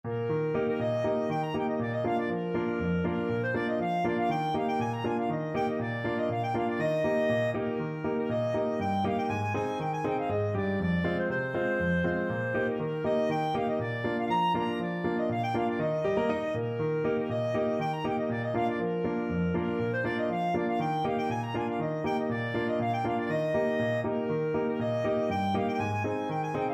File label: polska clarinet; bingsjo storpolska